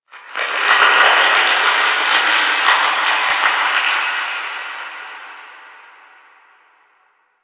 Ice Distant Ice Melt; Ambience; Warframe; Corpus Ice Planet

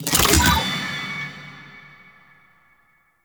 Special Artifact Pickup; Missions; Sentient Defend

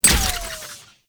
Glyph Prism Hit; Warframe; Duviri; Glyph Puzzle

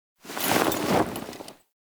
Camp Canopy Flap; Quests; Veilbreaker; Kahl Camp

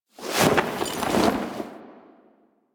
Camp Canopy Flap

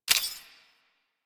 Railjack UI Mod Install A; DE; Warframe; Railjack Player Ship

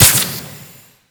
Jetpack Thruster E; Warframe; Corpus Jetpack

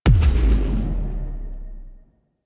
Thumper Explosion; Powers; Warframe; Railjack

